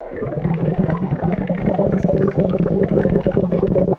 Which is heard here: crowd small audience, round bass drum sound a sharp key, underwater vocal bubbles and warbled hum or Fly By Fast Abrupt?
underwater vocal bubbles and warbled hum